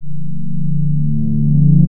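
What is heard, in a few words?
Pad Synth; Equipped Music; Loungin House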